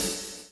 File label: PULL, Backbeat; Spectrasonics - Backbeat